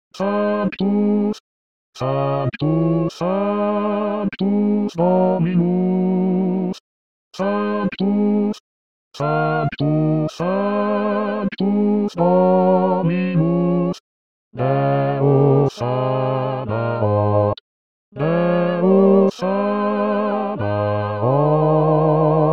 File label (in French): sanctus voix basse